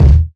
bassy steel kick drum d key

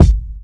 bass drum g sharp key; Duration 0:01; Mono; kick drum samples; one shots

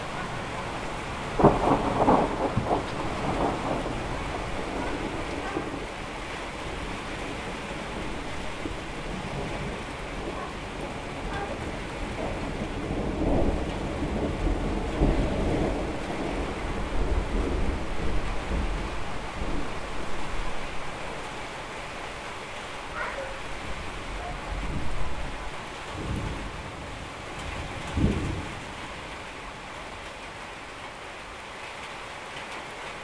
field thunder spring; soundscapes

